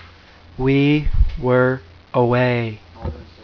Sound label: 8bit; we were away